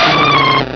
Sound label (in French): Cri